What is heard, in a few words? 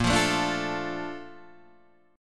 A sharp Major 7th, Guitar DADGAD